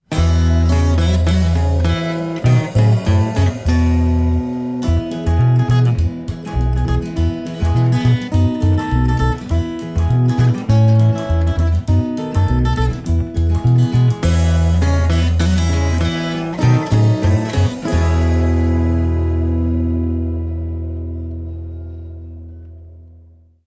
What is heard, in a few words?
instrumental